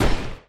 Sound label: shoot Spectre; Mindustry